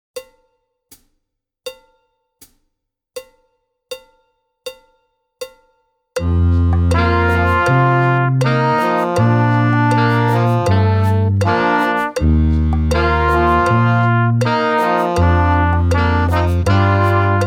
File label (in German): ohne Drums